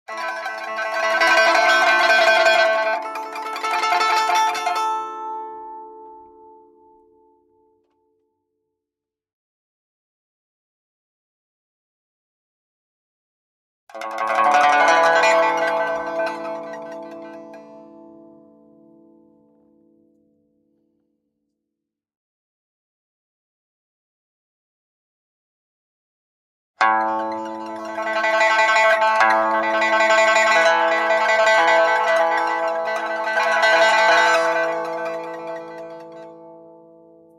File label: PiPa Playing Techniques Roll Combo; 揭秘：我们是如何设计中国民乐音效库的